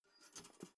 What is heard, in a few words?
toaster pull down soft; maschinen